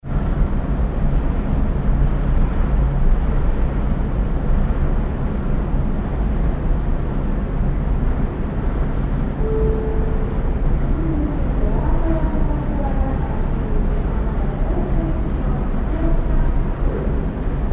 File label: Ship Large Ferry Deck Atmosphere under Sail; Tom Hutchings